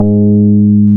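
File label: BAS MKS-50, bass, Roland; Sound & Vision - Gigapack II CD 1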